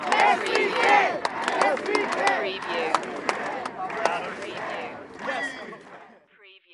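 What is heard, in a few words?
Crowd Yes We Can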